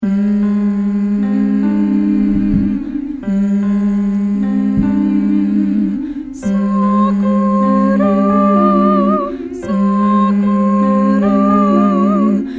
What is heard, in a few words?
Sakura Sakura Bass